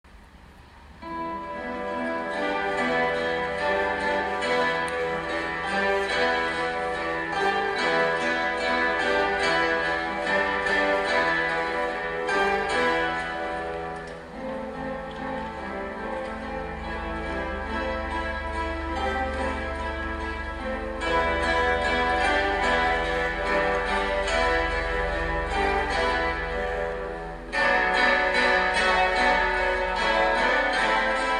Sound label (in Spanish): Musica Latina Facil Danza Maya, guitar